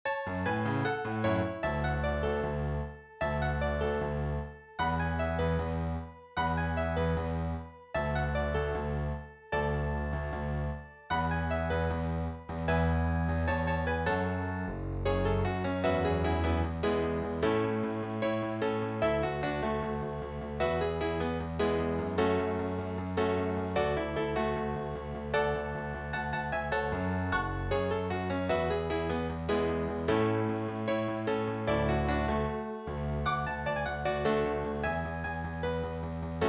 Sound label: accompaniment; flashdance vocal audition materials; KEYANO THEATRE COMPANY AUDITIONS